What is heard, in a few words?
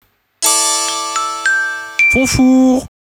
Windows Opening